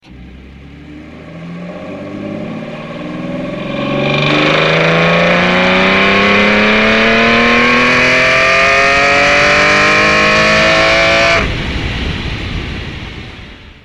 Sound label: Ferrari Enzo